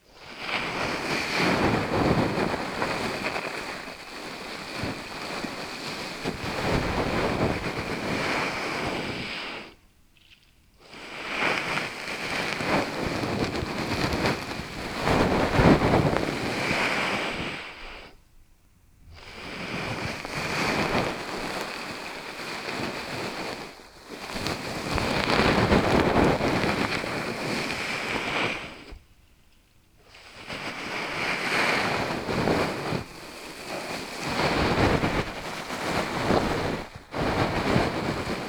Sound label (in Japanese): 梵天, 効果音素材